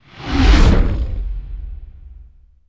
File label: Jet Low By Sweetener; Sony Pictures Sound Effects Series; Vehicles